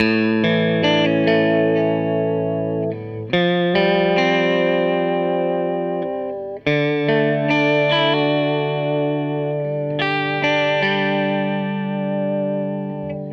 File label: Les Guitar Loop